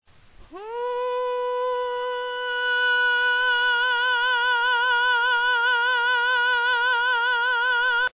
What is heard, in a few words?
falsetto faringea